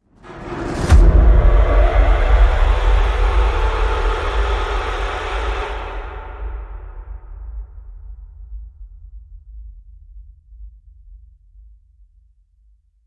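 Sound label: Hit Hor, horror hit; Comeback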